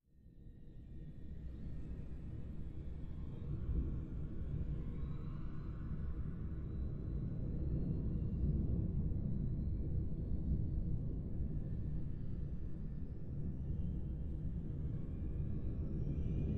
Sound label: Underwater Amb, ambience